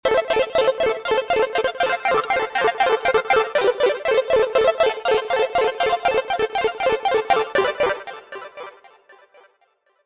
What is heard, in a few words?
analog arkade; serum